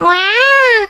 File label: voice cat nya; moe pachinko; android game music